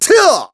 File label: Attack; Ghirahim; zelda sounds; Skyward Sword